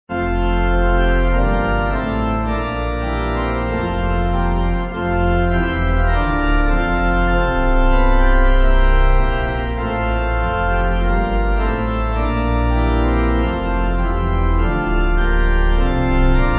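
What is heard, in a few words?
See Amid The Winters Pipe, Pipe Organ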